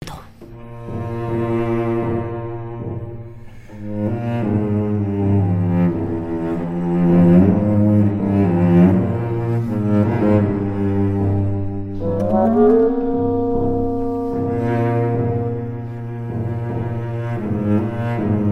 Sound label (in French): Violoncelle; la revue de cuisine